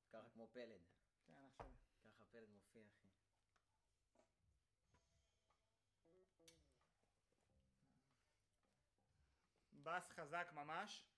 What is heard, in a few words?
Trumpet Room